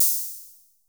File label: HI; Music Store Sound Service; Einstein